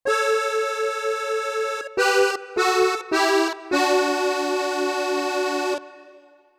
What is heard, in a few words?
Accordeon